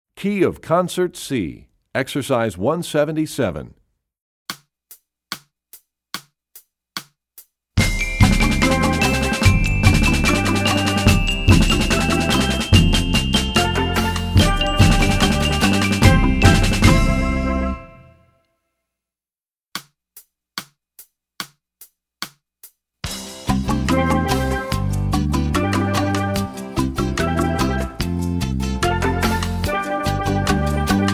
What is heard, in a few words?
Percussion; Rubank Studies Concert C; EE2000 Book2